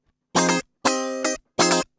GUI 120 5aF; Best Service Gigapack 2

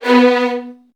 B1, VN 16TH, Virtuoso Strings; ILIO; Kirk Hunter

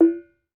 BONANG, Bronze Gamelan

